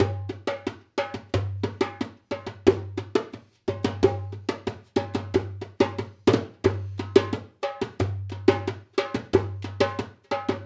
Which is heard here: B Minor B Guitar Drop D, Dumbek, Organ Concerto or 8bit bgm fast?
Dumbek